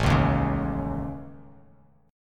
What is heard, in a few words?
A Major Flat 5th, Bass Standard